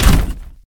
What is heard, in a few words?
Deagle